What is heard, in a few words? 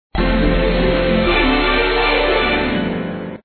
Gold Coin Screen; Nick Block Party; Block Party 1